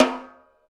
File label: St Snare Wires Off, Roland BK-5; Snares